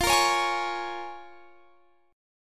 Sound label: Bb Minor 7th, guitar